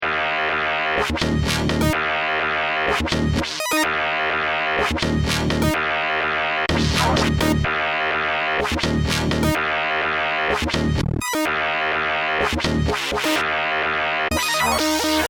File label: building blocks